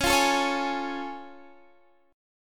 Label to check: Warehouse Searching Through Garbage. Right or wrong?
Wrong